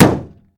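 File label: suspicious knock on a car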